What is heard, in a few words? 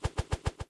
swing woosh, dagger; weapons; fowl-play